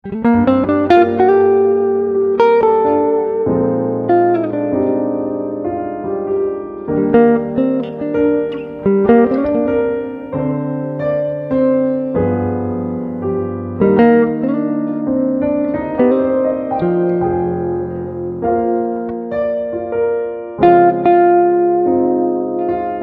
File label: lounge guitar